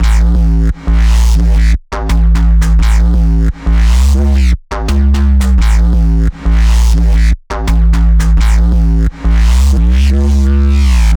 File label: Bass Loop, 172bpm, A